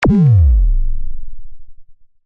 Pulse Descend, Scifi, Pulse, Descend, Science, Fiction; Battle; BlastWave